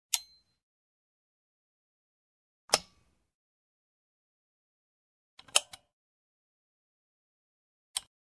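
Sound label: zvuki razlichnih viklyuchatelej pereklyuchatelej knopok, sounds of various switches, toggles, buttons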